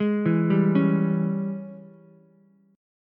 Ab Major 7th Suspended 4th Sharp 5th Ab down Ukulele; arpeggiated; JGuitar